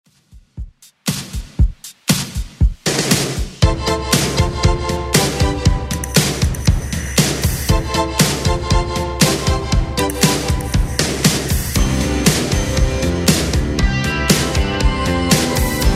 80s Redrum 118bpm

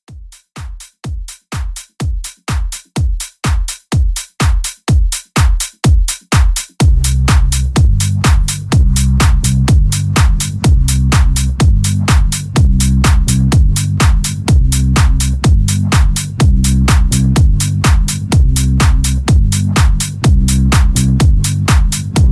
70s House 125; House Re-Drum

